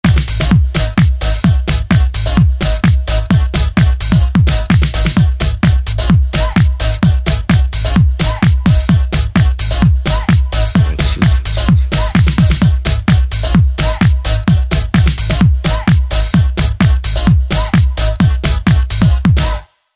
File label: techno